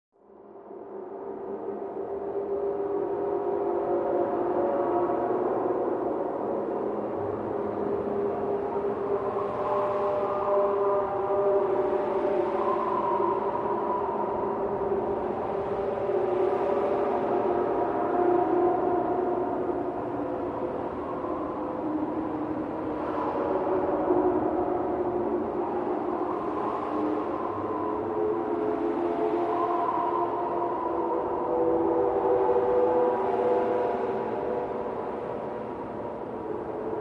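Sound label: Horror Scifi Background; horn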